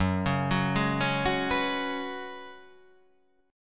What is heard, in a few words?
F sharp Suspended 2nd Suspended 4th